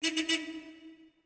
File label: CAR HRN; VEHICLES